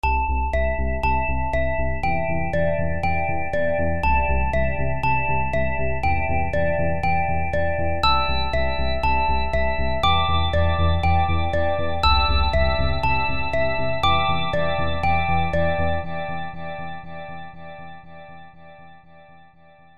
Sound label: samsung s9